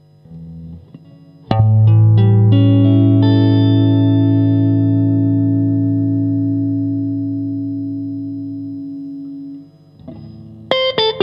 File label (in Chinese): TEXASS Lite 国产铜线 清音 1档; kindman